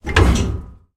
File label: turret klank, tanks